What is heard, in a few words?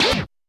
Lft Rght, Alesis D4